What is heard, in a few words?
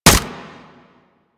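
Primary crossbow Shot; Warframe; Tenno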